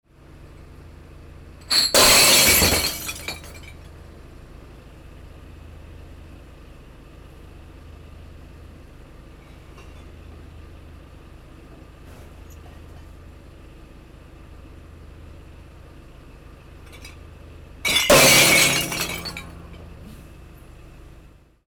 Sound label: breaking glass waste collection